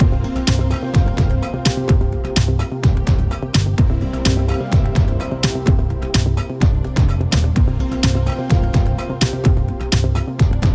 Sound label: BGM field desert; starbites